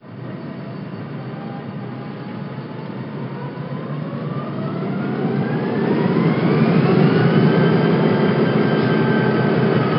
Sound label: gp35 startup